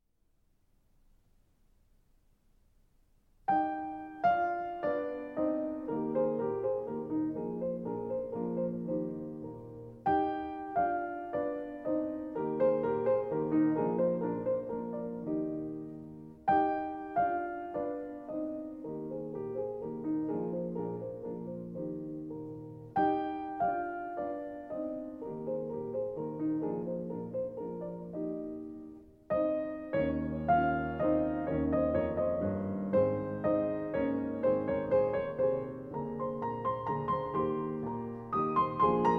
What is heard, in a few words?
Landler in Es-dur; Schubert